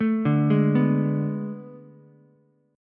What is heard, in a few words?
A Major 7th Suspended 2nd Suspended 4th, Ukulele; AM7sus2sus4; arpeggiated; JGuitar